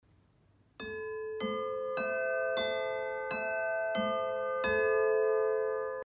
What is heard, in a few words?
Harmony Bells A4 A5; Indigo